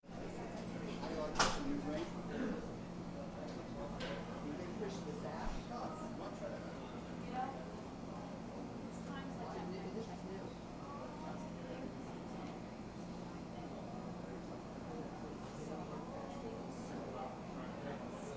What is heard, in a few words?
coffee shop